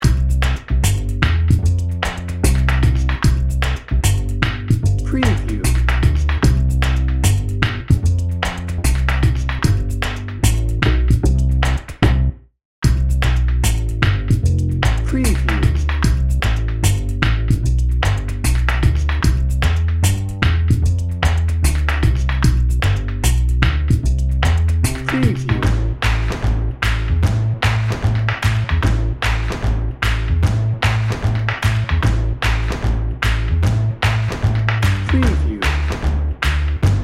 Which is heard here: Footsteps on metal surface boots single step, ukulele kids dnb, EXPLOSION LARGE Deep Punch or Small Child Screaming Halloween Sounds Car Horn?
ukulele kids dnb